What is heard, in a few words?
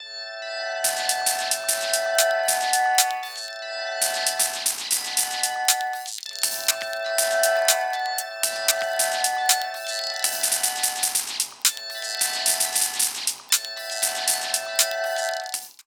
beat